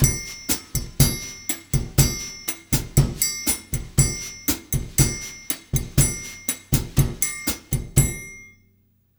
120 BRUSH, Brazil Bossa; USB Soundscan; AKAI

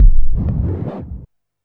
percussion loops, Techno; PS-45 WAV Techno ID

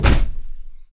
HUM FEET S, Stompin' Feet; Roland LCDP14 Africa VOL-2

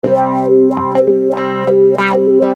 Wah Guitar; Construction Kit; HIP-HOP; Sonic Foundry (Sony Creative Software)

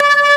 B3 Lo Dist Slow C4; Sound Blaster

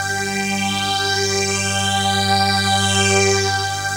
SYN, DANCE SYNTH; Sound & Vision - Gigapack I CD 2

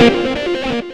xtra lead

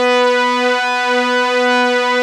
OMNI STRIN, ARP Synths; E-MU Formula 4000 Series Vol. 3; Analog Odyssey; ARP Synths II

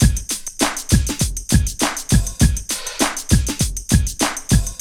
100 SWING, Loops; AMG; Now CD-ROM (Roland); BLACKtoBLACK

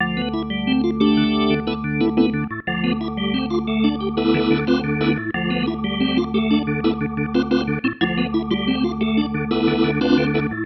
Pattern, Organ

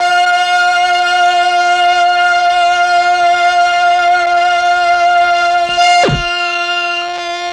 MEL STR, Legendary M400; ILIO; Propeller Island

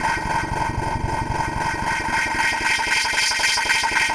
Echo Chuff Down Up, Synthetic Pulses

